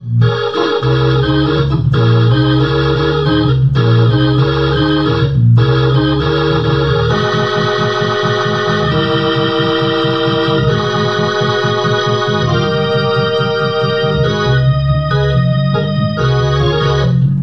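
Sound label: Gospel Shout, rotary amplifier speaker; B3 Gospel Shout; MOON Amp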